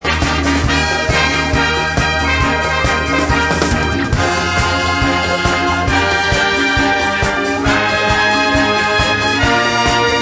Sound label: Lets Dance Swing stereo